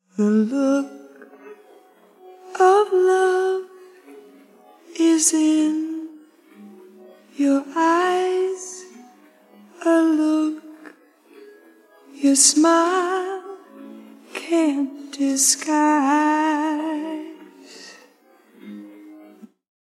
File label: only voc